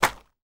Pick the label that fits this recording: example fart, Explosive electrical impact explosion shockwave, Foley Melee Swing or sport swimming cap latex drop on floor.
sport swimming cap latex drop on floor